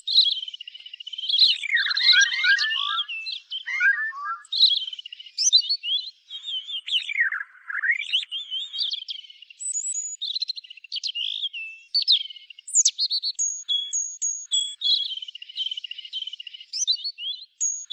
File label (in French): rouge gorge, oiseaux